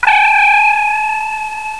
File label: tos beep; xquake